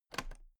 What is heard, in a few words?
toolbox latches open regular